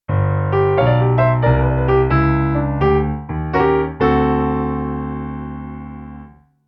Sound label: Blues Introduction Key of G